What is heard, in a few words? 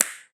snap